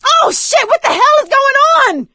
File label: grabbed by smoker; voice; Left 4 Dead 2; producer